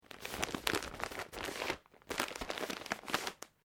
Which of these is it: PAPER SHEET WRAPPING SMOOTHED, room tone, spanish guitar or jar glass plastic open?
PAPER SHEET WRAPPING SMOOTHED